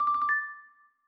screen Curtain On; NVDA; Cubic

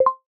Live Translation Stop; iOS 26 Sounds

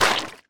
mud step; Golden Crayon Sounds

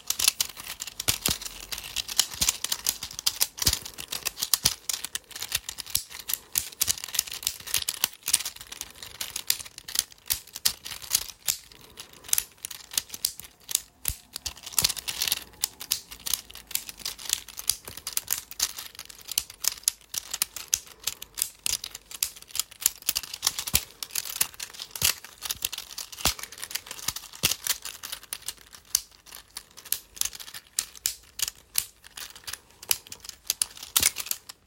fidget infinity cube; Golden Crayon Sounds

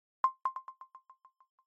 Alert Battery Low Salient Haptic; iOS Sounds

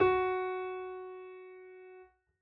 piano f#; Golden Crayon Sounds

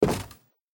wood step; Golden Crayon Sounds

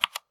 keyboard type; Golden Crayon Sounds

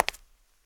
marble step; Golden Crayon Sounds

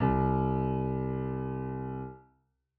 piano c; Golden Crayon Sounds

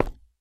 tile step; Golden Crayon Sounds

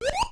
Screen Change; Salem's Sound Schemes; mario

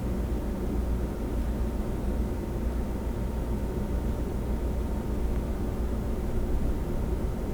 fridge; Golden Crayon Sounds

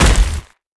rope fall; Golden Crayon Sounds

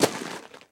flint step; Golden Crayon Sounds

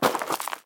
ledge step; Golden Crayon Sounds

